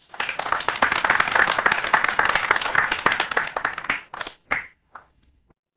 crowd applause

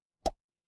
cartoon pop

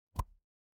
ui pop

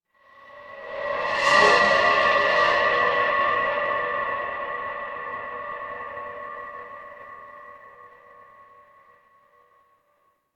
horror stinger

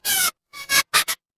cartoon squeak

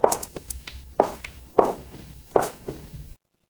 footsteps heels

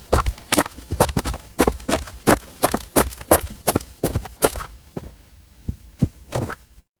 footsteps gravel

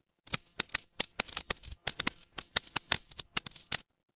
fire electric crackle

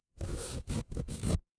paper fabric fabric

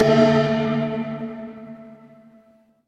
instrument gong

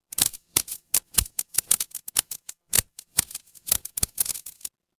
fire electric crackle

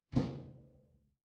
impact slam

